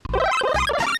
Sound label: Power-Up sound; SSB